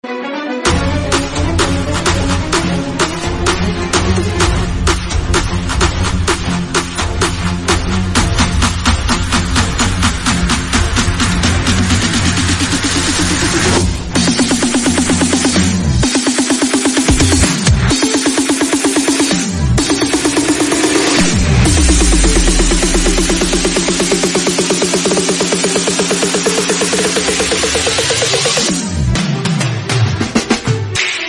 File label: Tamil Bgm Ringtone